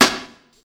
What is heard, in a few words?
small reverb steel snare drum sample a sharp key, Royality free steel snare drum